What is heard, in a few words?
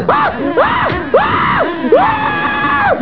screamin